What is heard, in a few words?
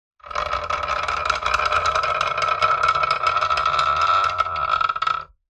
industrial lever metal large heavy slow movement creak; zapsplat